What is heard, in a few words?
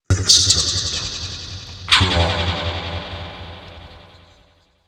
Vocal Loop 126bpm, HYPNOTIC TECHNO; Zenhiser